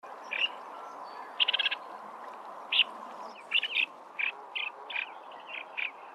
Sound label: sandpiper pectoral